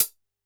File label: Hi-Hat Closed, Standard Kit, Yamaha PSR-E323